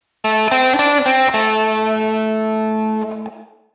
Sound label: Lick